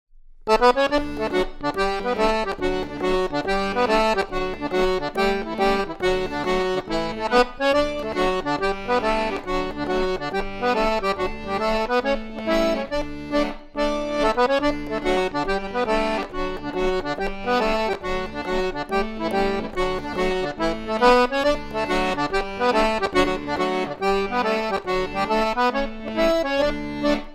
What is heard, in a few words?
Fishers Hornpipe, Folk Orchestra